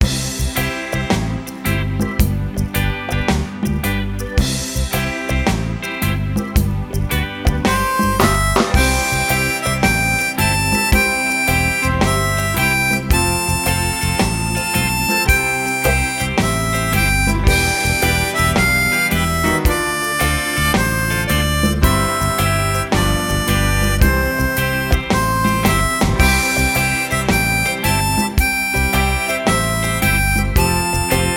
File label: Harmonica to listen to, blues harmonica; Michael row the boat ashore